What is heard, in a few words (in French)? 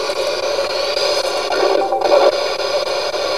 bruit talkie